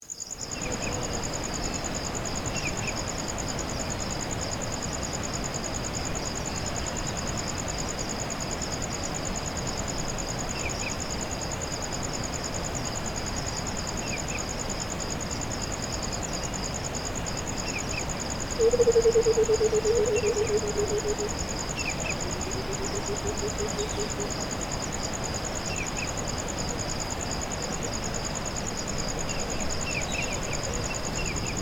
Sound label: Falls Ambience; Murchiso Falls